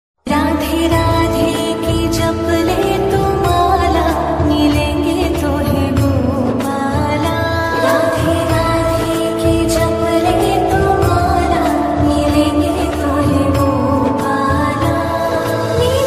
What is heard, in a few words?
bhakti ringtones